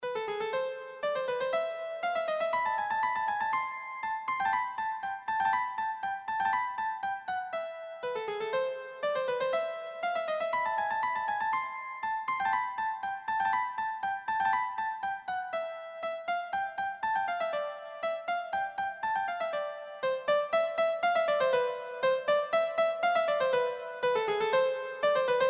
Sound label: mozart turkish march, keyboard